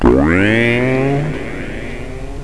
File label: SHOOTING; Roly-Polys no Nanakorobi Yaoki